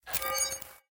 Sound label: Stairs; Blind Quest - The Frost Demon